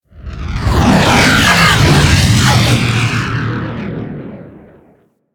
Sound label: Fighter Ship Close Fly By, Space Fighter Ship Engine; Vehicles; Warframe; Rail Jack; Rail Jack Enemy Ships; Corpus